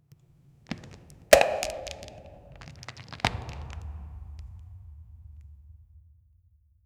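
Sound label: empty plastic bottle crushing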